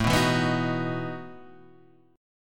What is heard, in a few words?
A 6th Suspended 2nd Sharp 11th, guitar; A6sus2#11 Chord {5 2 2 4 4 2} strummed